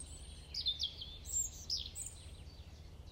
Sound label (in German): Rotkehlchen